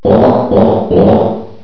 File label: OH OH OH